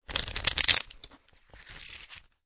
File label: Cards Playing Cards Shuffling; Playing cards, poker, shuffle. Shuffling cards.